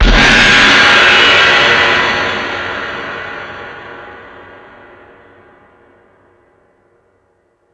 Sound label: Space Drums, Drum Machines